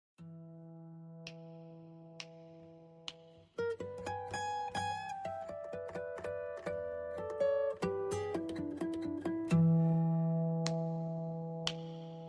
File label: guitar; Blue Bells